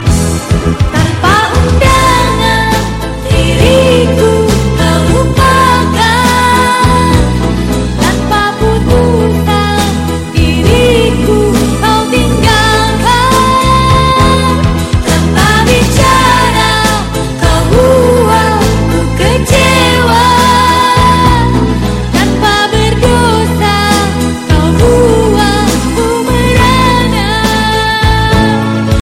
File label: malay song